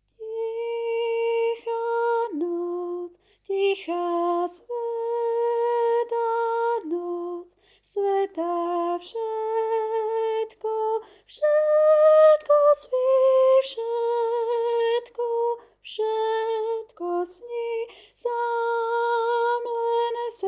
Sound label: Tenor; Vianoce 2019 - Tichá noc; Farnosť Letanovce